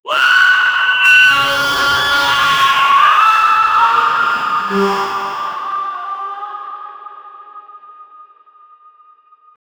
cyborg

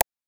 ui button click clean

